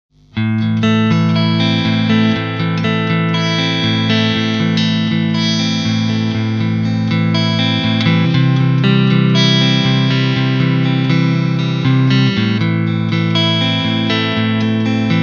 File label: clean; Sub-Pre; new amp